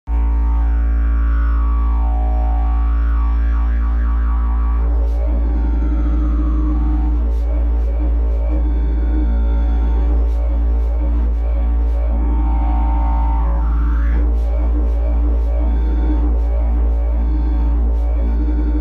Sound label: jilm ultrabass, didgeridoo